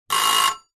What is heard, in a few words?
household oven timer bell short ring; zapsplat